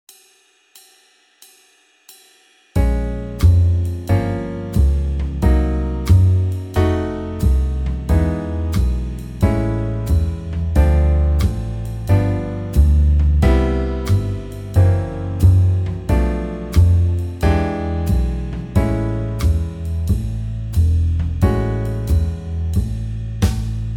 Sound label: Jazz backing, Guitar; Guitar Techniques 2017 Issue 272 August